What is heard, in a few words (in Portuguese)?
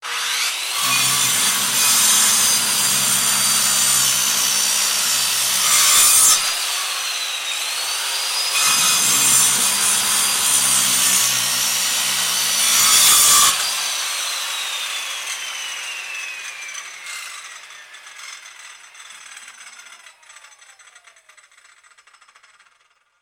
serralheria